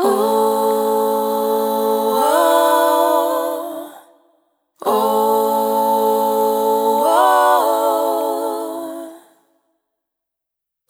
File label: Multi-Voice Loops, Vocal Samples; Coincidence; Sonic Foundry (Sony Creative Software) - Pocket Diva World Class Vocal Samples